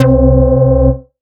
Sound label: Bass C, Offbeat Bass